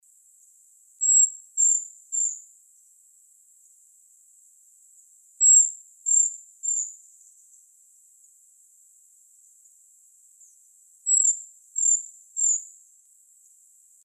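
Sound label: obyknovennaya pischuha golos, common treecreeper voice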